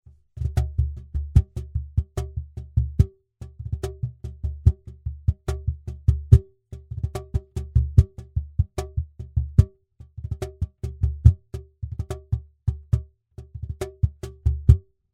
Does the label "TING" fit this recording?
No